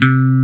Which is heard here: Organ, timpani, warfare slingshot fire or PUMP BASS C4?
PUMP BASS C4